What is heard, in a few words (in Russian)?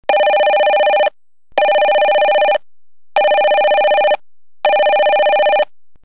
domashnij telefon